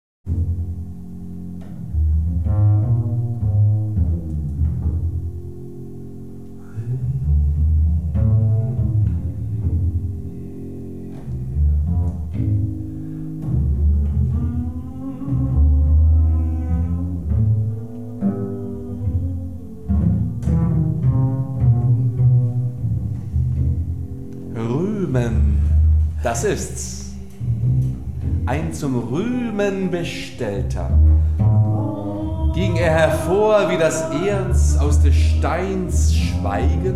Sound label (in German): Poesie der Stimme Impro; Rilke; Ruehmen das ists